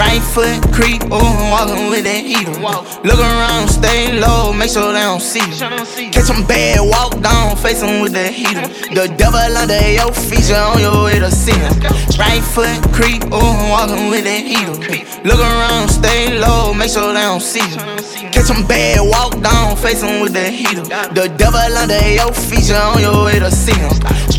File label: emote griddles music; left4dead2; kodua; fortnite emotes